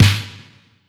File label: Snare Wet; Deimos